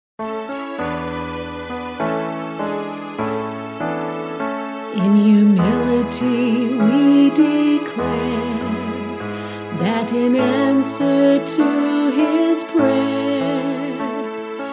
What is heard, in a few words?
Vocal